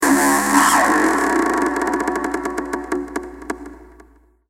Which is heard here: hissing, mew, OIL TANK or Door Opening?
Door Opening